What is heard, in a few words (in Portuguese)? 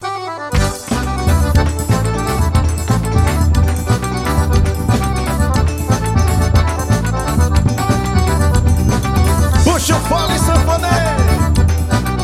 forro baiao xote